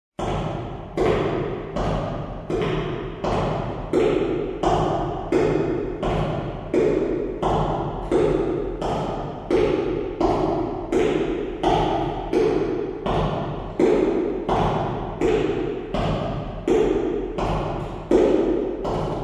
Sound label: Footsteps in an empty hallway